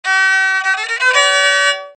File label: Rebec